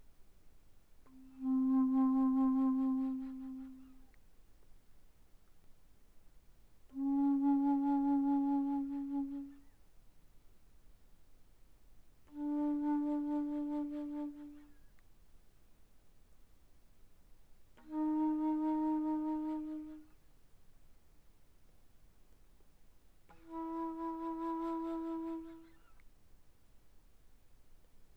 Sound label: Bass Flute pp C4 B4, woodwinds